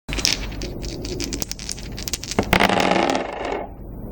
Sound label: Dice; Yahtzee